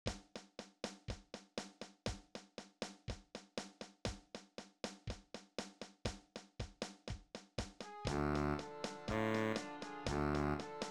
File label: Eb Tuba